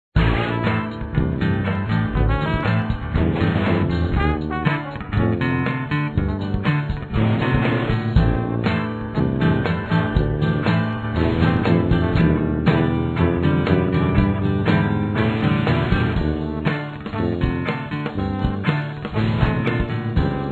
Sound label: Lighthouse Band; club penguin